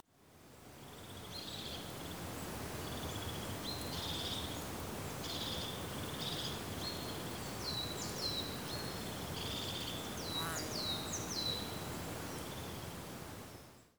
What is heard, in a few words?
Forest Ambience; tamarin o.s.t 2020; Fraena Forest